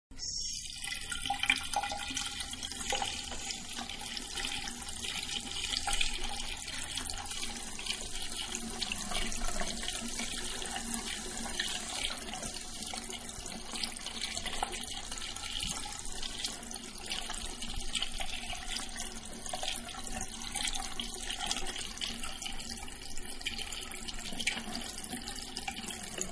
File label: Sink Running Water Far View; Bathroom Sink; Bathroom (Sound Effects:Household); Manhattan Production Music